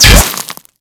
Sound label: Bolt Impact Stone; Warframe; Cross Bolt